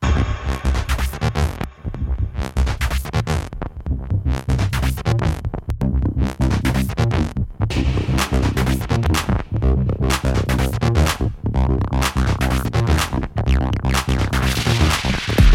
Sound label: UNO Synth Glitchy; ik multimedia uno synth; سینتی سایزر و سکوئنسر iK Multimedia Uno Synth